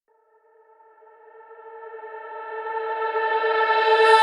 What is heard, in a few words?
Vocal One Shot Solo A